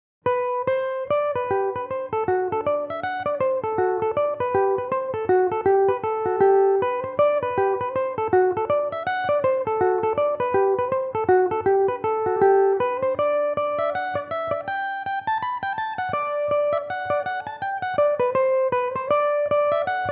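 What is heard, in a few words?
G major belmuse, irish mandolin; Belmuse EM-190 electric mandolin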